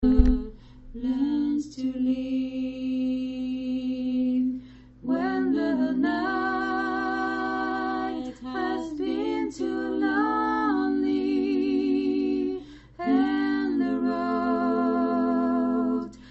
trois voix

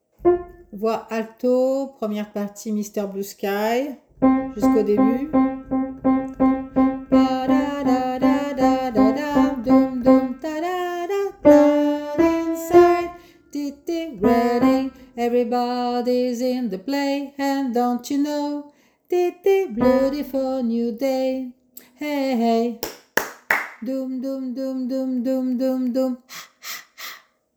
voix alto

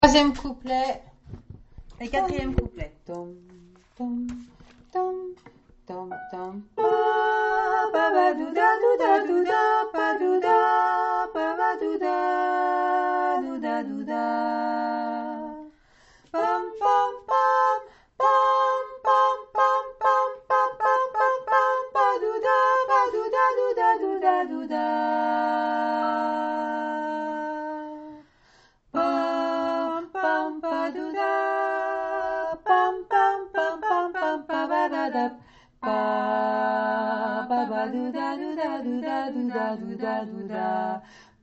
Le bolero couplet

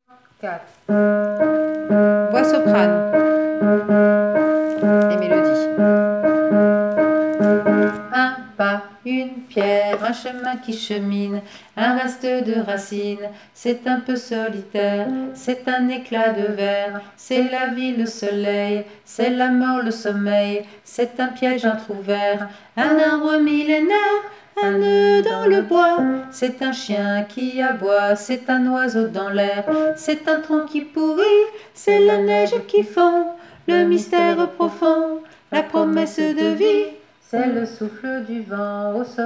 melodie et sop 1ere partie

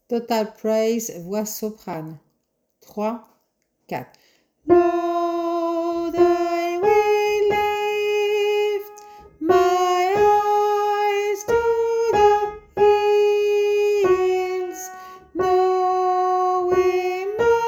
voix soprano couplet

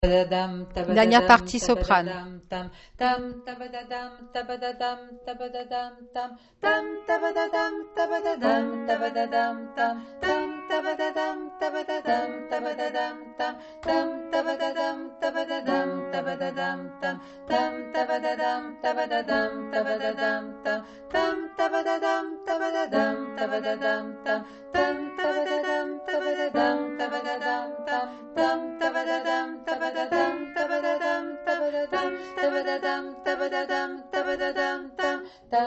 alto sop

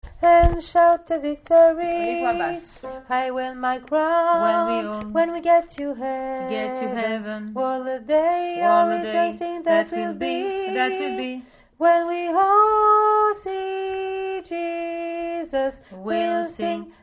voix basse

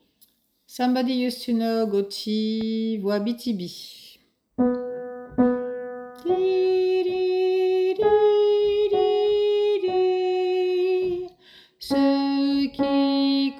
VOIX

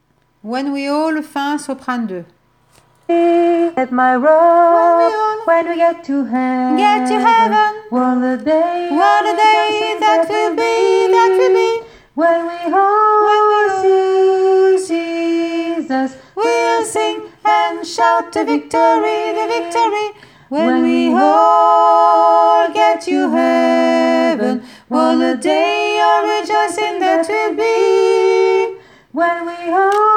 voix sop 2

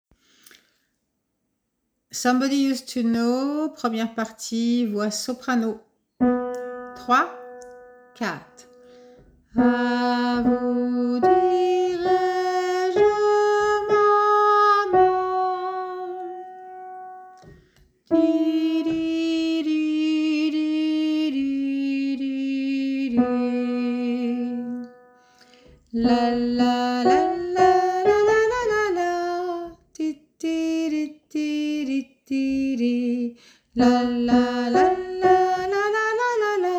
VOIX SOPRANO

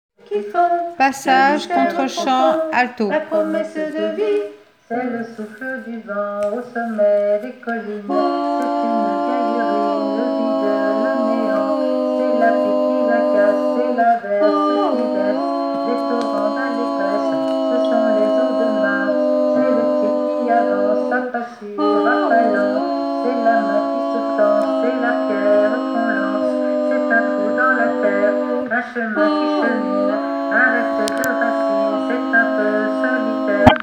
voix alto

